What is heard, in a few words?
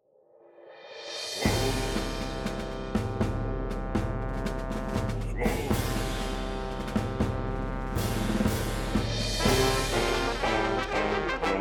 Sailing; PAPER MARIO Color Splash; wiiu